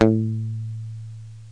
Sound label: ELECTRC BASS; OMI - Universe of Sounds